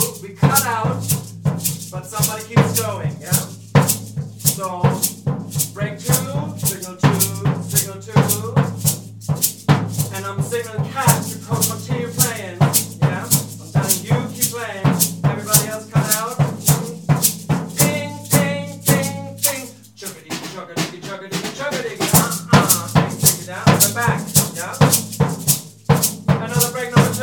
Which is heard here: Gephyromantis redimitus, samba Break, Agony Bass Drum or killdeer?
samba Break